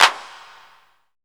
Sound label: clap, hip; Best Service; Dance Mega Drums